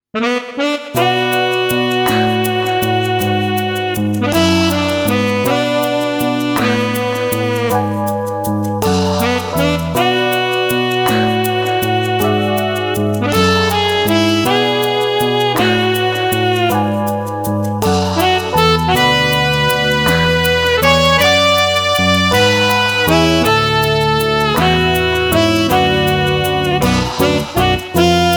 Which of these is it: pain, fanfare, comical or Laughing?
comical